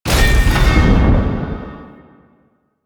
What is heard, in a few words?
Void Dragon Glaive Pickup; Enemies; Teshin; Warframe; Duviri